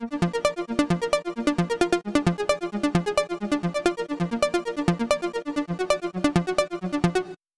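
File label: Arps, Slow Gear; Set the effect to Stereo in the Pro Edit section as well to fill out the sound stage.; Roland Cloud BOSS Effects Pedals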